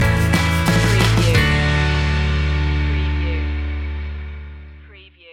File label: indie feelgood stinger